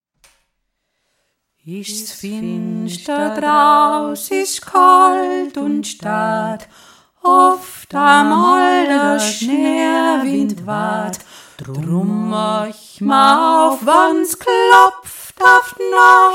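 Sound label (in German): is finster drau alpenländisches weihnachtslied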